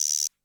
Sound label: guiro short, CR-78